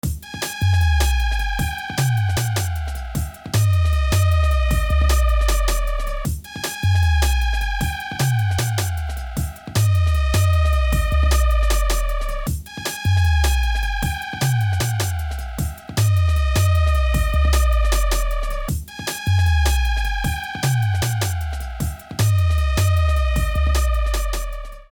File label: 20 stabs